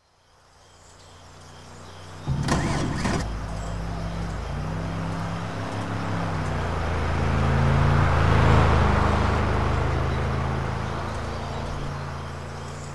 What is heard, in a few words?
aston-martin db9 v12; TDU-1